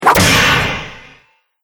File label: Great Axe Destroys Plate Mail Armor, impact; Impact Sound Effects